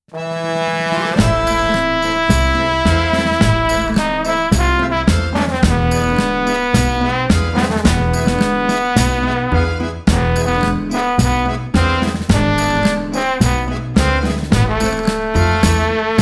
Tango

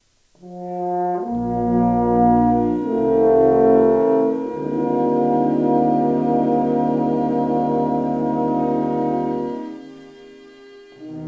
Brush

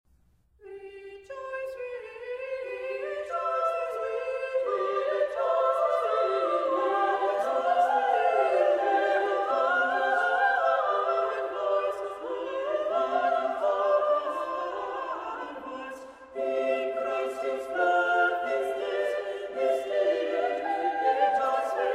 sacred song for voices and 4 viols; From Virgin's Womb; with chorus 'Rejoice, rejoice'

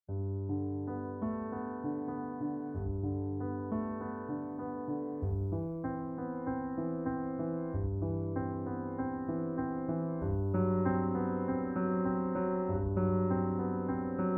cello suite

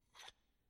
cedar blocks, ballroom

